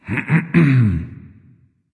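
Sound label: nonverbal clear throat; smite; voicepack; ullr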